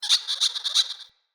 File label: Squirrel Freed Call; Encounters; Animal Rescue